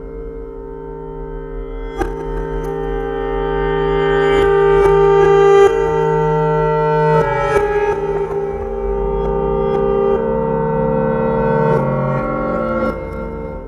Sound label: Autoharp Reversed; Dogon Autoharp Reversed; Sonic Foundry (Sony Creative Software); Loop Noir